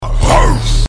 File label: bark, vox